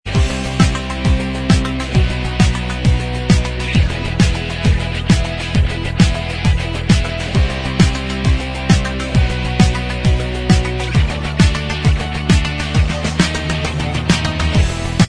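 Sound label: instrumental, backing track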